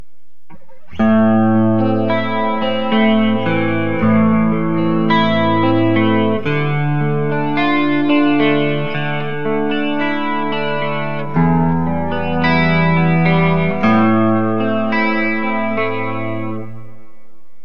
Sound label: neck clean; Burny